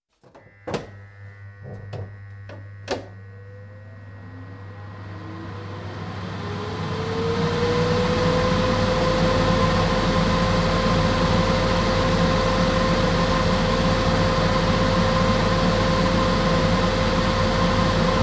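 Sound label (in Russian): фен включение работа выключение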